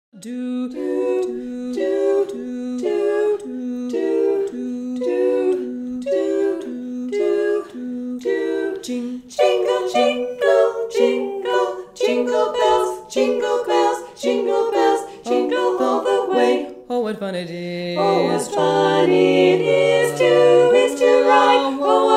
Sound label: Fandango Quartet Snippets